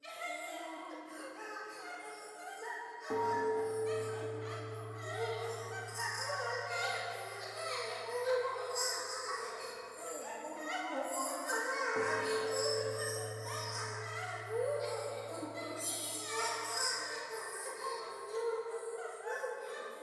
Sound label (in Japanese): トイレ 喘ぎ 神社